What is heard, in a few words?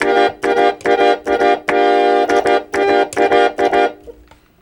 Harmonium; Sonic Foundry (Sony Creative Software); World Pop; Keyboards